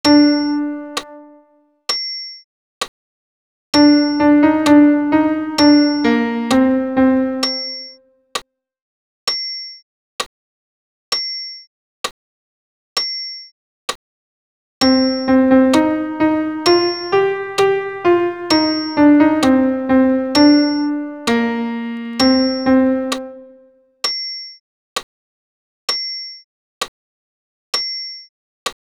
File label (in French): Gloria ten pian seul